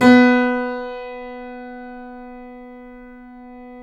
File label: KEY, Steinway ff